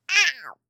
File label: ouch; CosmicRage; CosmicRageSounds; birthday